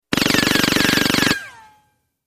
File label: Fast Silenced Machin, silenced